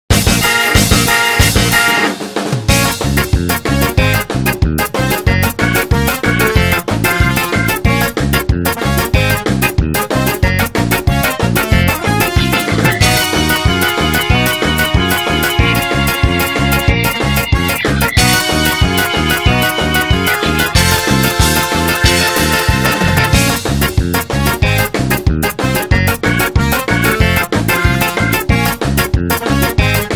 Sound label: userBattle; bgm; championship